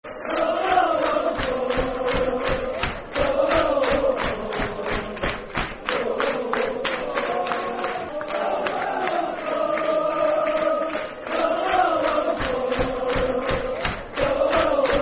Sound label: Lulalulalo, Football Chant; Dundee United Dufc